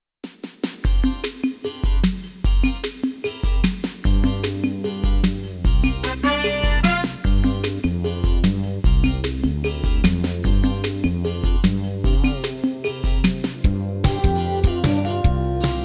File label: Countdown Explanation Bed